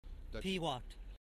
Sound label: loop